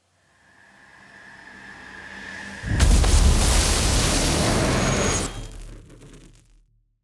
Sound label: Riser & Electrify; Ending1